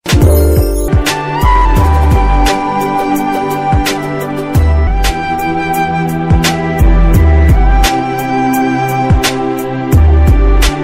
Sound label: Flute Ringtone; Ye Maaya Chesave; Telugu